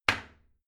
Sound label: toilet seat close regular; haus-garten